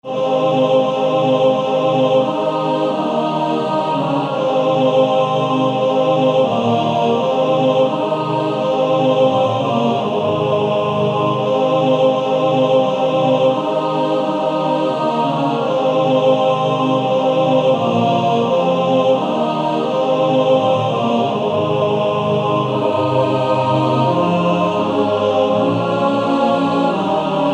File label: Male Choir TTBB; Angels We have Heard on High